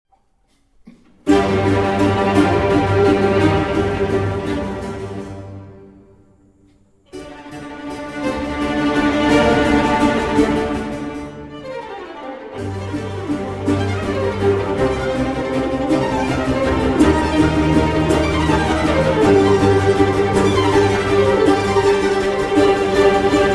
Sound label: Violin Concerto in G Minor Presto